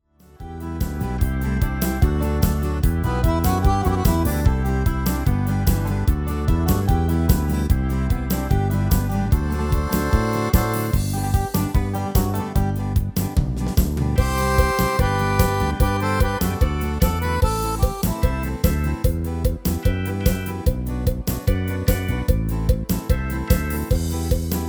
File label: MIDI